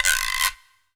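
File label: Scrape, Percussion Hits; Zero-G Jungle Warfare 1, 2 & 3; 90 s Sample CDs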